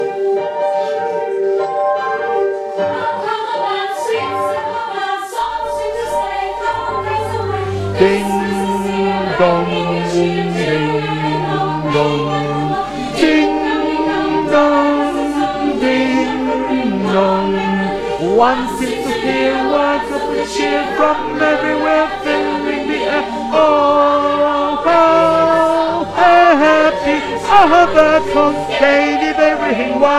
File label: Tenor, gospelchor